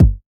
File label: clean kick drum g key, Royality free bass drum single hit tuned to the G note.